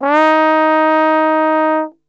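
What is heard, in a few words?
D#3 trombone glissando, Brass Super Section; Best Service; TRMB GLISS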